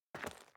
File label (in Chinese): 室外, 脚步